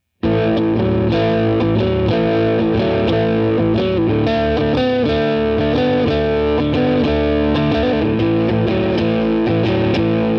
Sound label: Pedal